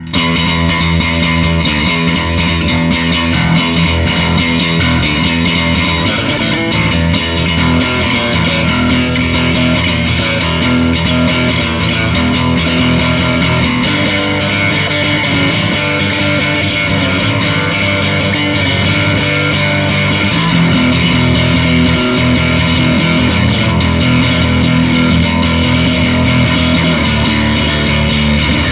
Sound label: bass amp, bass test